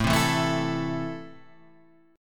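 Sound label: A Minor, Guitar Standard, chord strum; Am strummed; JGuitar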